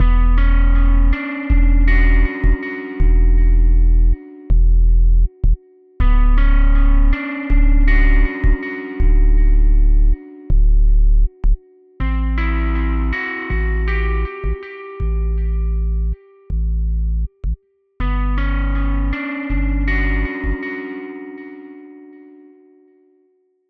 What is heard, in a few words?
trip hop guitar